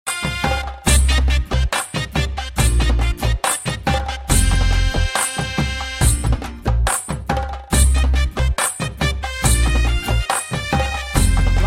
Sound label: music; alternative